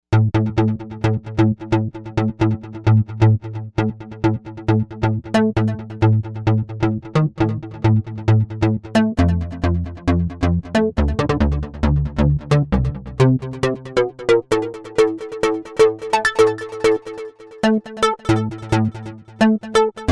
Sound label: Gumbo Bass, Novation UltraNova; Factory Patches